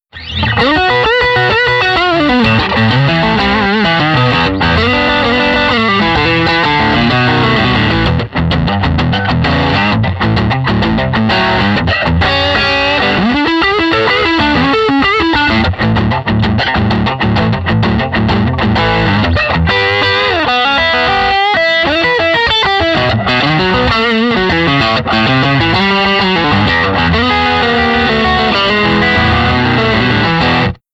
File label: Sparkle Drive Texan Style